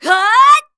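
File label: MON ATTACK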